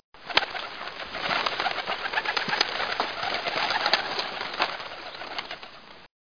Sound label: pidgeon